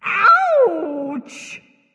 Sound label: ouch; smite; izanami